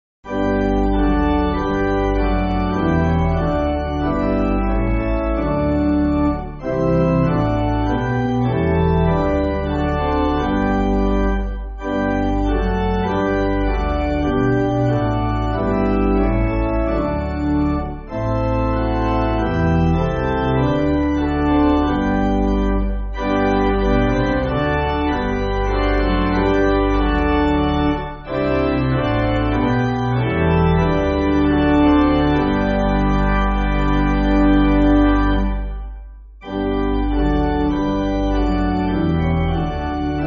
God Who Madest The Earth, Gott Des Himmels, Pipe, Pipe Organ